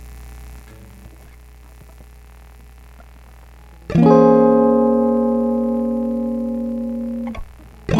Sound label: last noise